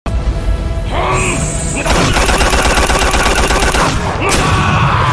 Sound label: kick; dios muda